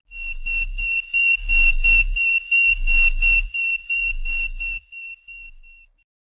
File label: spout